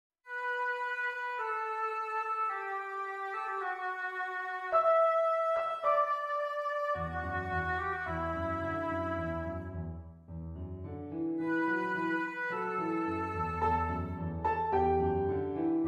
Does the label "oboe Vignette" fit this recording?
Yes